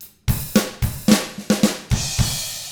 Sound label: POP ROCK, Drums; ILIO; Double Platinum Drums 1